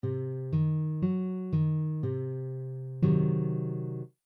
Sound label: triad c diminished